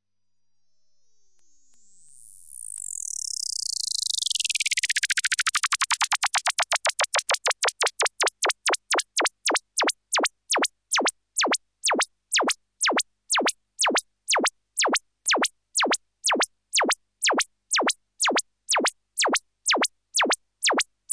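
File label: Game sounds resembling rotation; Igrovye zvuki pohozhie na vrachenie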